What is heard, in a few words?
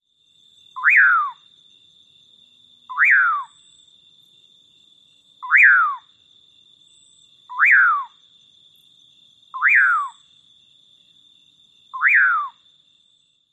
songs; TX